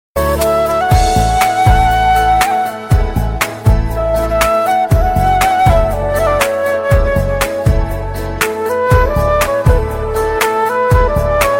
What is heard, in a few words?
romantic